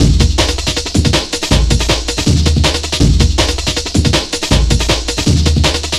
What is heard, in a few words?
breakcore breaks